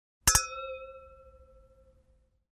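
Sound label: hit and sound of wine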